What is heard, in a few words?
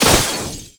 Enemy Iceberg Crystal Delete